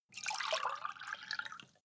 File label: glass pour fast; soundpool